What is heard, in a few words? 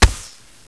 slap knife hit